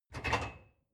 dishwasher cup grab soft

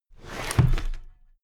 drawer cabinet paper close heavy halfway

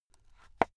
watch case small close soft

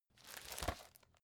folder file jacket turn short

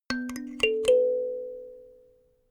notification kalimba sequence ascend

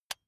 electric button drill direction switch lock soft

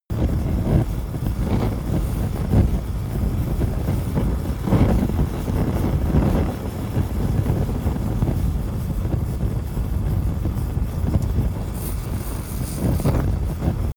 fire object medium burn

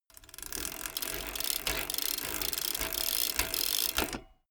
bmx bike pedal accelerate

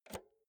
insert jack multi socket quick

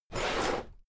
drawer cabinet equipment open heavy halfway

